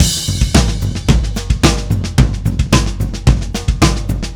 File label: Drums Crash, Indie Rock; Session 01 - Brighton; Sonic Foundry (Sony Creative Software); Indie Rock Anthems